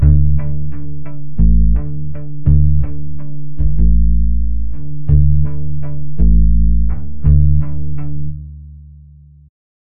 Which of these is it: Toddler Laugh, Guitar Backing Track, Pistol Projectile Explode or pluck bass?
pluck bass